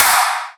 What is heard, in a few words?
Big Clap; Claps; Future Bass; Drums; One Shots